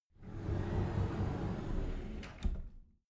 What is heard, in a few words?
cupboard slide door open soft